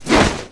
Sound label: Pickup Misc Swish; Pickups